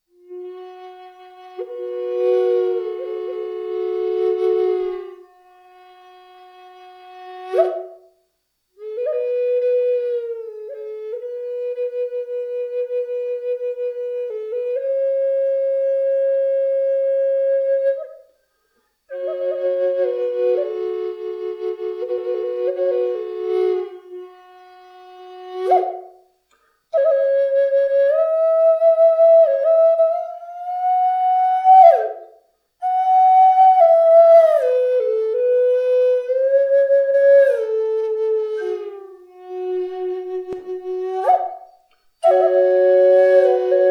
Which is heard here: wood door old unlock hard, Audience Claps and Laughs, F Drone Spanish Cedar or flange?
F Drone Spanish Cedar